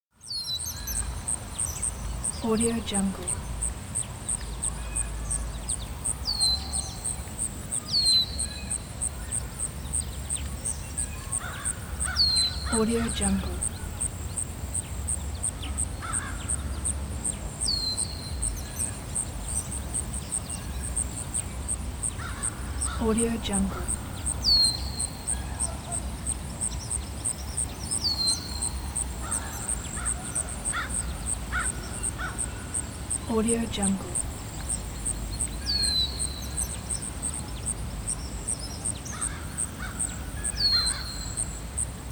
city park ambience

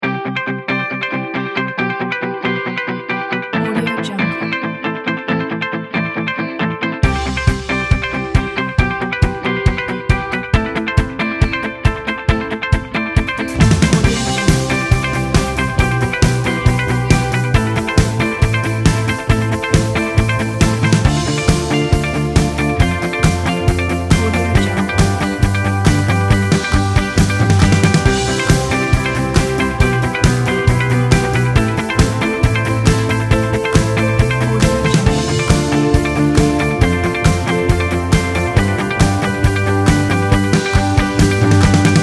pop motivational; chatblanc

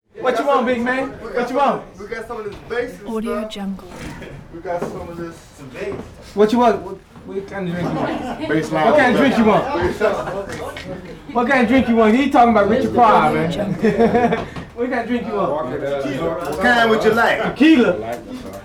party walla constant backstage

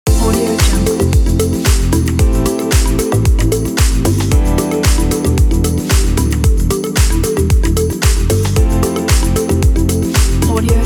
fashion luxury chill house loop; hotloops

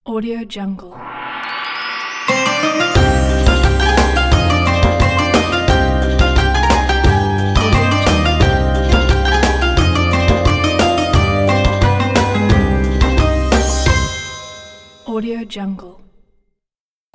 ramadan logo

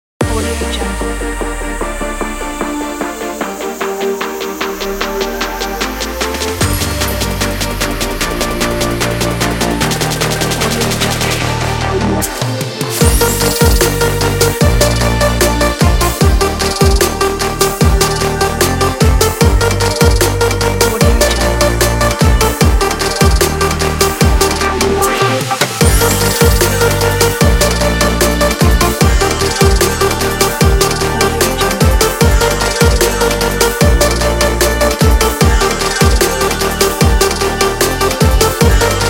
future bass

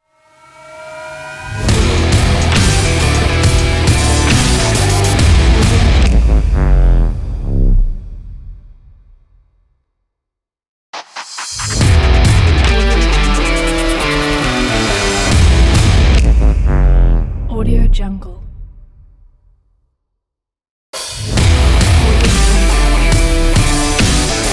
action rock stinger ident

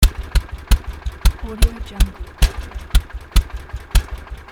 small engine sputtering loop

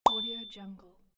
eye wink

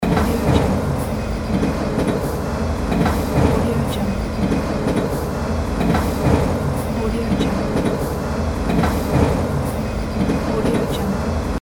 freight train loop

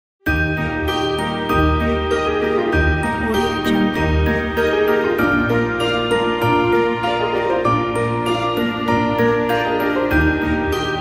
merry christmas

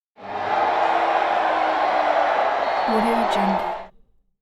wrestling crowd

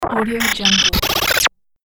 glitchy

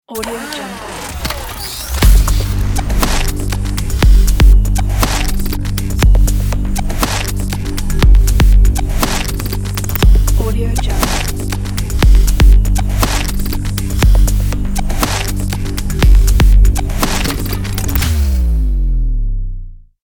glitch tech logo

